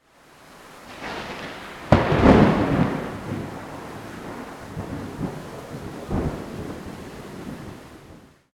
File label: Thunder Very Close Rain; InspectorJ; 44.1 General Library